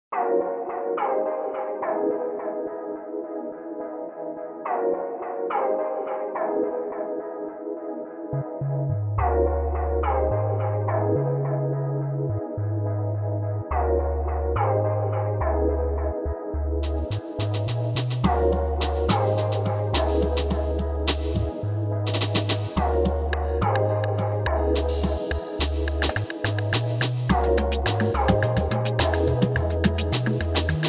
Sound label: Drum & Bass Song